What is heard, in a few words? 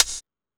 Techno G, Drum Machines, EMU XL-7